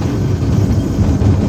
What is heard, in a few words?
coaster loop; Left 4 Dead 2